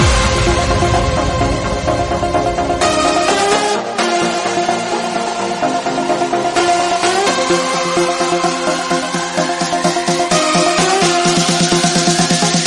Music Ringtone

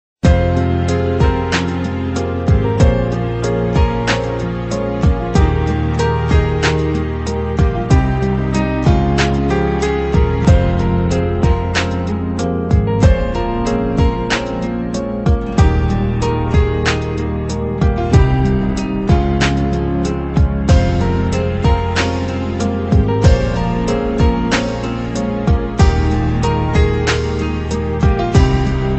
Guitar Ringtone

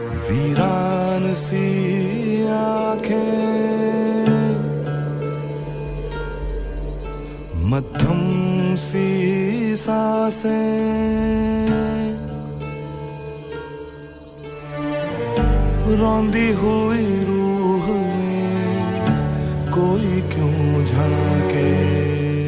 Bollywood Movie Ringtone

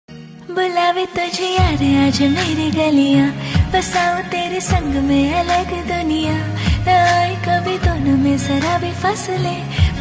Female

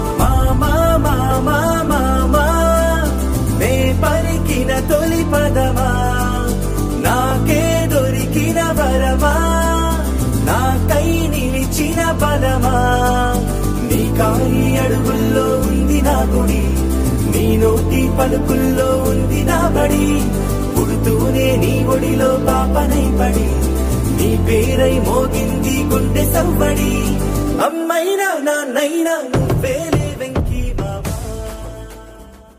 Telugu Movie Song Ringtone; Venky Mama

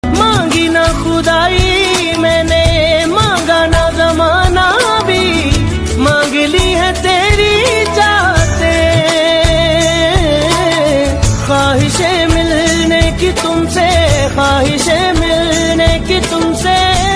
Hindi Ringtone

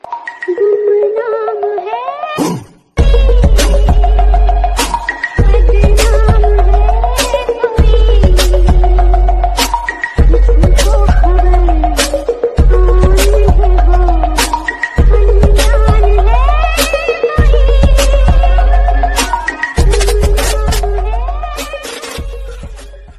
Remix Version Ringtone

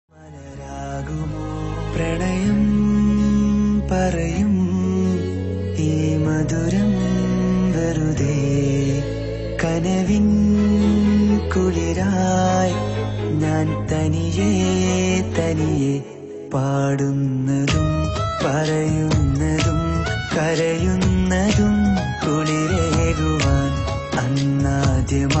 Love Song Malayalam Ringtone

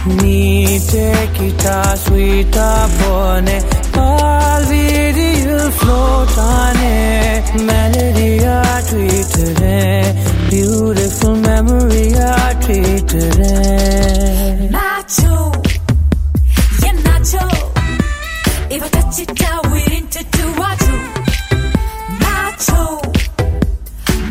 Tamil Ringtone; Mersal

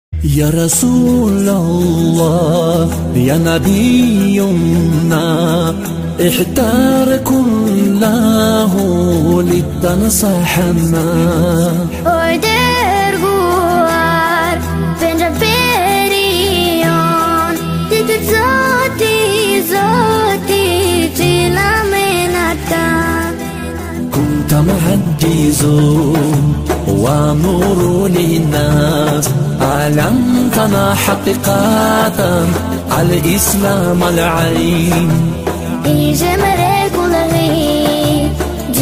Arabic Naat Ringtone

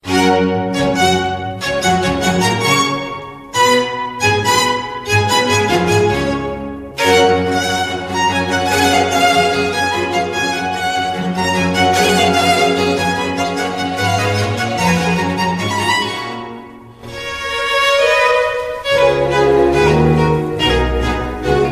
New Instrumental Song Ringtone; ringtones

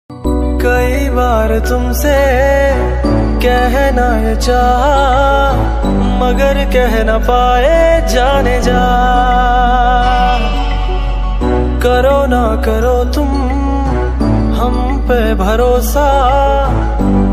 Romantic Hindi Love Propose Song Ringtone

